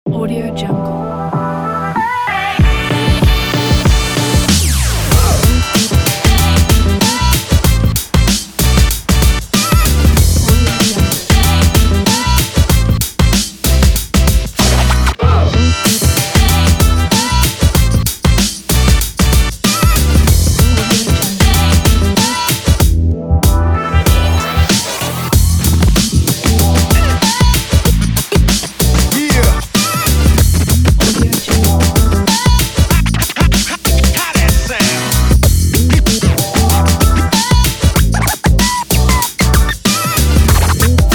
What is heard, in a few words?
hiphop